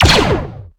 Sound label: clone blaster